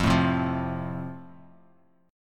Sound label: F Major, F Chord; strummed